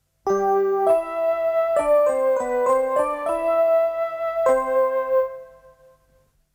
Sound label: Radio Romania International IS